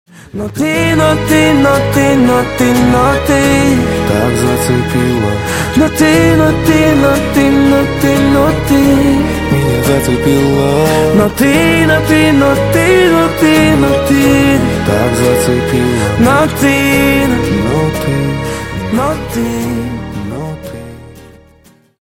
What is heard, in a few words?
acoustic version